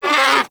predator vocal pain; Warframe; Hunting Critters; Characters

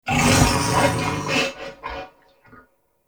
Wolf Ambient Call; Animals; Duviri

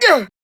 Pain, Grineer Queens Guards; Battle Barks; Warframe

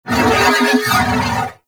Horse Vocal Screech Jump; Vehicles; Warframe; Duviri

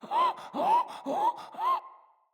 Infested Runner Breathe Quick

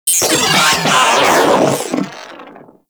Moa Vocal Death; Warframe

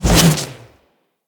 Melee Jump Slam Short Charge; Whooshes

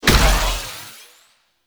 Hack Ability Burst; Warframe; Warframes; Helminth Abilities

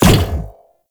Alt Fire Projectile Bounce; Weapons; Warframe; Corpus; Tenet Quanta

